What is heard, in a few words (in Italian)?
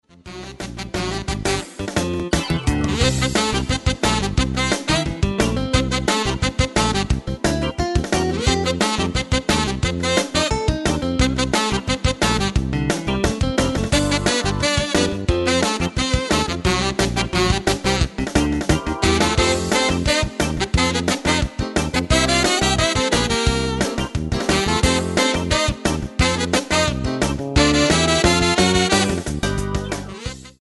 Agitato